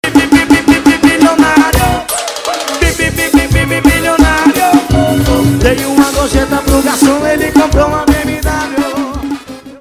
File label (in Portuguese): forro estourado